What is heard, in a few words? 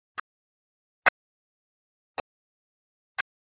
clapping alg; dereverb